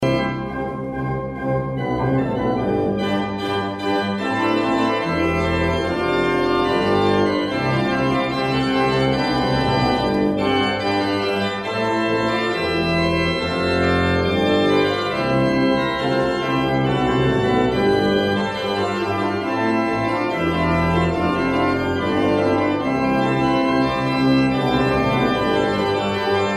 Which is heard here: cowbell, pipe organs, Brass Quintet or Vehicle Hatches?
pipe organs